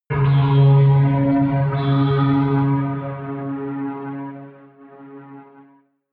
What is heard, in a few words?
synth chirp deep, drones